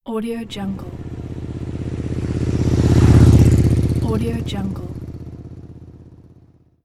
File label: motorcycle passes by